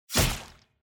cut

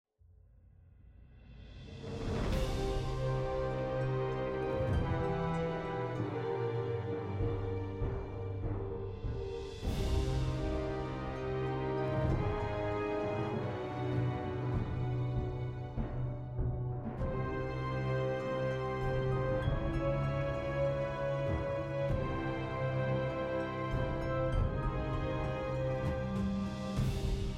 Event Sad, music

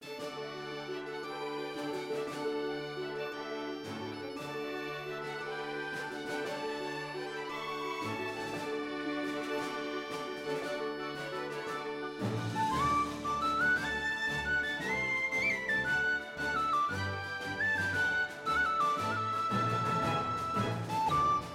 Battle Victory, music

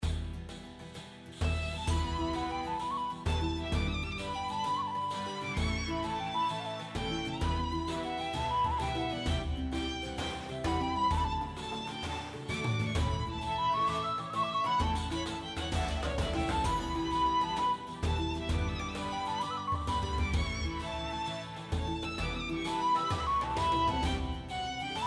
Event Heated, music

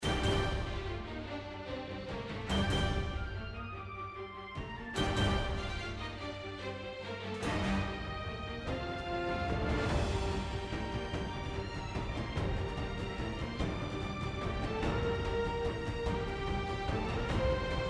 Battle Imperial, music

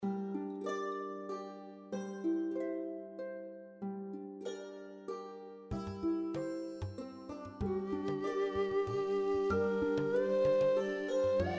Scene Grassland

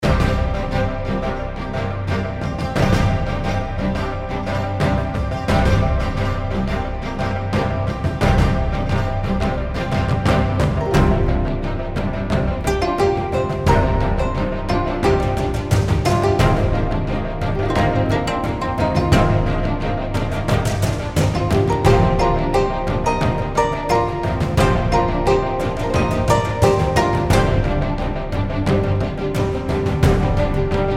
Scene Orientalsword

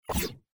UI Close Window

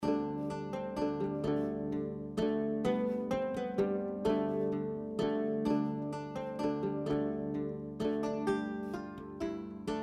Lute Solo, music

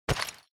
UI Switch Equip

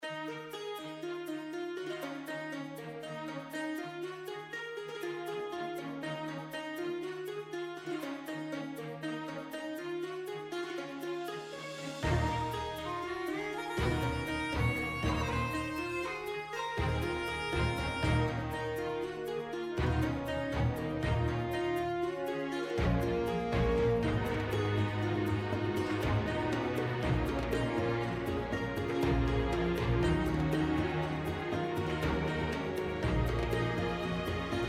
Event Tension, music

